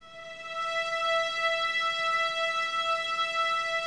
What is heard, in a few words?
VNS E 5, Violins; 23 Violins; String Ensembles; Miroslav Vitous